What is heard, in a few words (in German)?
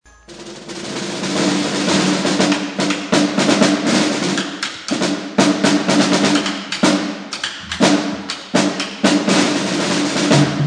trommel